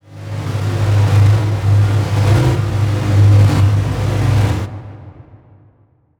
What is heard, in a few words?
Transition Stab Dark Medium; Production Element; Title Transition; AdobeCC